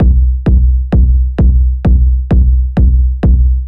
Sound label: Disto Kick Loop 130bpm